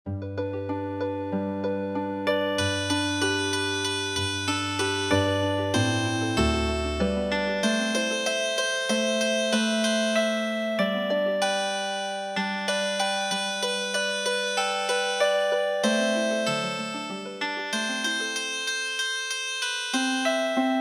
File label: Healing Harp; goose march